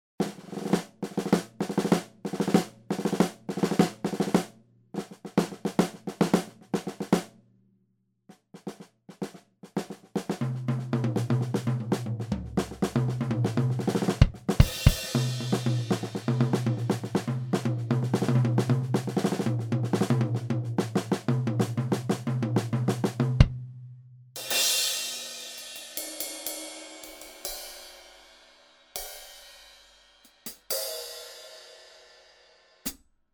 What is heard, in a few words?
fusion drum kit